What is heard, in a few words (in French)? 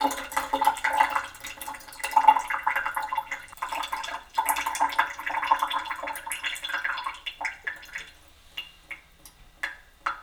gouttes lavabo